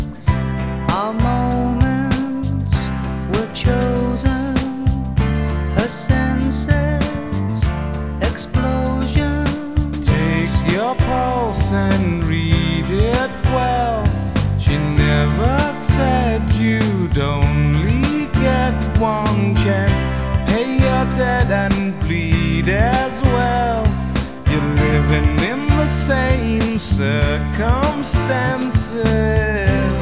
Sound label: rock